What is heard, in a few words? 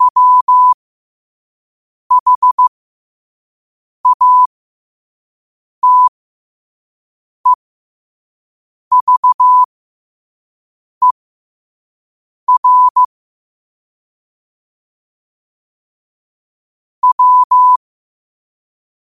5 wpm, morse